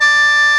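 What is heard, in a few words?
sheng C#3; AKAI S6000 CD-ROM - Volume 1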